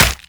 bullet damage npc

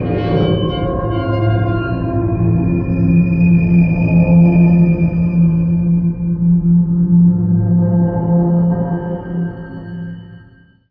industrial suspense, music stingers; Half Life Model Pack; Half Life 2

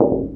glass sheet step; physics; Portal 2

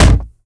impact, wood chair

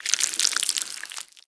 flap long, zombie blind jaws; Half Life Alyx; creatures

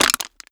tin can, crushables; oneshot; Half Life Alyx

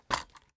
rotate weapon, ui; Counter Strike Model Pack; CS:GO; panorama

